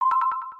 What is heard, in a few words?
Check Off; aronwk collection; ndaudio